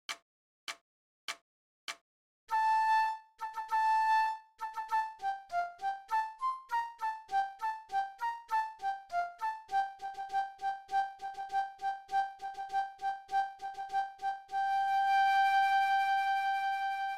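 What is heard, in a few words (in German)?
Floete, 100 bpm; Wilhelm Tell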